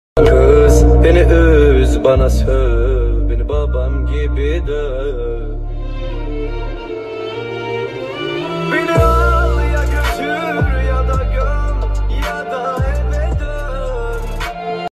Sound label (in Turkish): Telefon Zil Sesleri